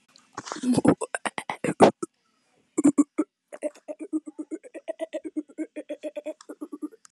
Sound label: hehehehehehehehehahahahahah